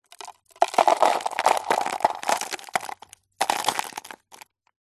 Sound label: foley stones put into paper cup; zapsplat